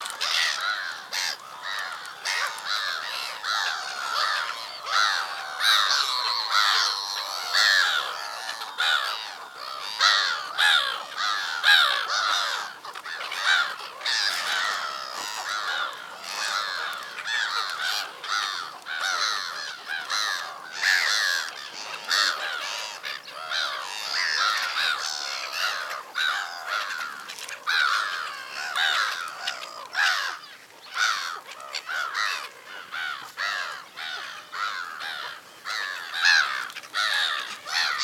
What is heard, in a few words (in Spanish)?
gaviota capucho gris voz, aves